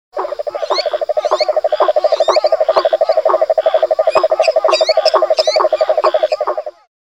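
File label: Bufonidae, toad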